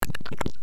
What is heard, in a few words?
trying to unlock